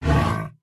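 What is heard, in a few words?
bear wound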